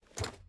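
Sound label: footstep armor; smite; kuzenbo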